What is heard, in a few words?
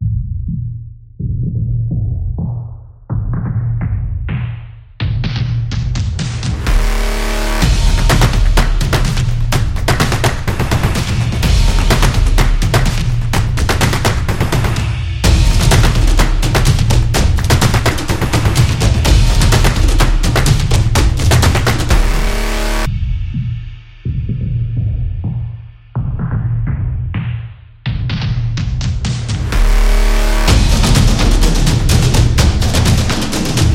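This Is Sport Trailer, Street percussion background music built for sport, motion, and dance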